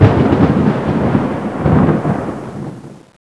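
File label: thunder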